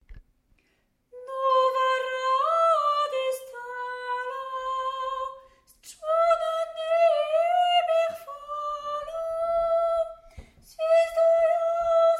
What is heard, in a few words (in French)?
noel ukrainien tenor